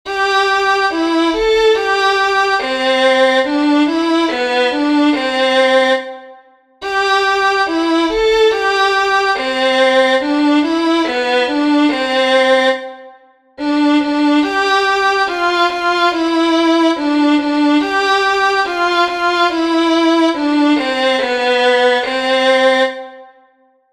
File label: hang